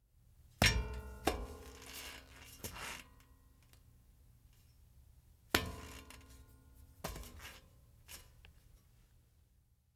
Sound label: Metal Hits with Ring; Fairlight Sound Lib